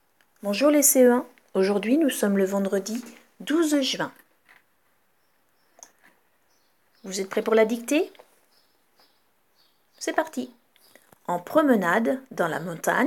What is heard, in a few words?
Vocal